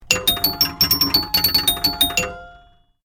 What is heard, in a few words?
PINBALL alert SIGNAL; mata HARI